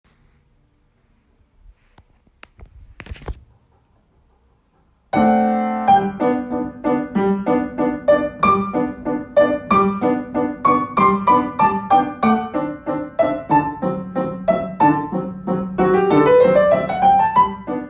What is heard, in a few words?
polka